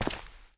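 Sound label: Normal Man Walk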